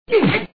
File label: Attack